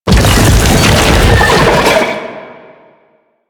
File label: Octopede Unearth Dig D, Sounds Enemies; Warframe; Man In The Wall